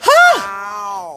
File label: huh ow, voice; ooh ahh; heh huh